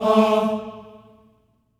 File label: Staccato M A3 L, Symphony of Voices; Spectrasonics